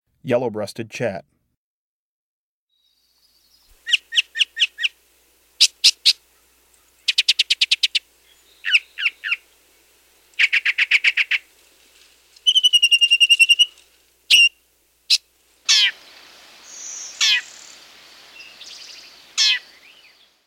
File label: Yellow-breasted Chat